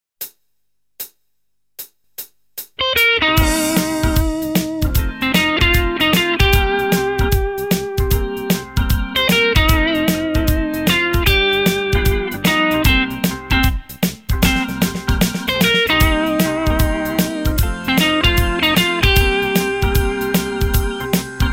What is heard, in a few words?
Vibrato